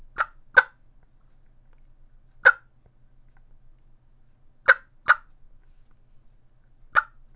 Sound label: rio grande special mouth call clucks, turkey; Southland